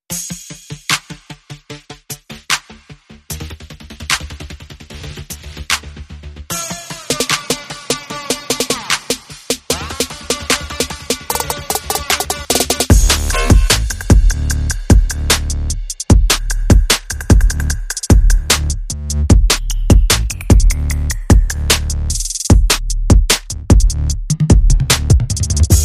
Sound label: Bass and Drum